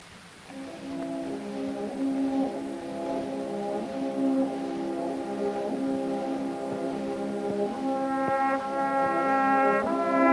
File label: Gramophone